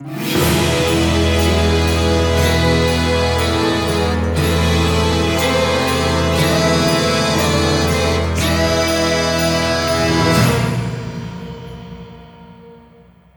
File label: Course Intro Fanfare; crash bandicoot series sound box; Tire and Ice